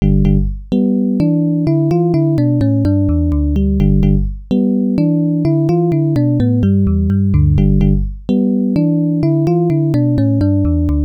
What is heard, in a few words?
arcade main; elevator action